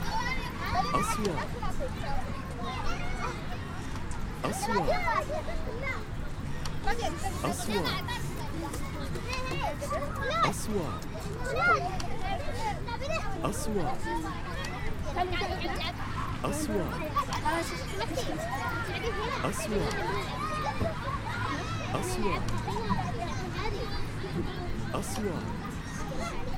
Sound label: Kids Playing On the beach